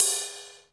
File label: HR16B RIDE